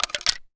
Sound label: Reloading begin; 完善武器音效; DungeonShooting; Godot